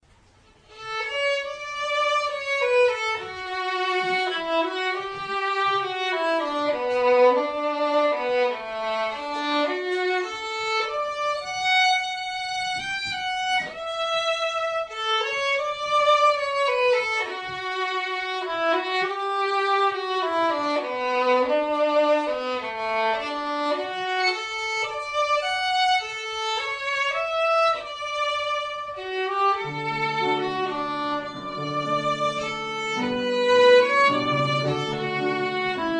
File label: music Violins